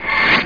Revive Shield; Crystal Caliburn™ DEMO

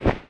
WING; ageofwonder; Moves

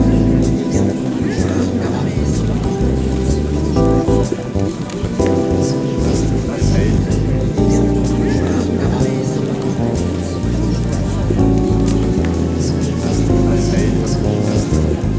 piano bar; MOBICLIC 57

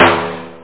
reggs snare

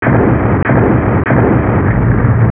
3 booms; TacOps

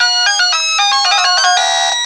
end music; charleycat; cartoonz!

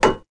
build

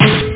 sdrum

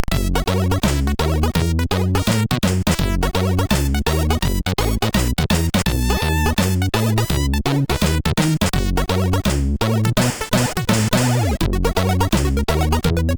Jazzied, SIDTunes

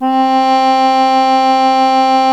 Bandneon, Organ; GMTones

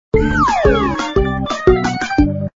music lose; magic heaven

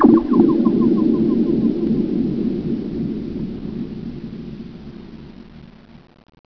echos lfo; Chris Marker - Immemory

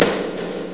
Klack; Bip ⁄ Boing & autres; Bipeeuuu

